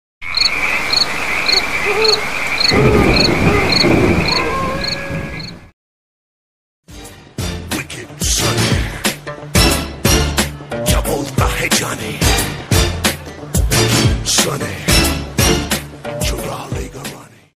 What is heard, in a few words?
funny sound effects ringtone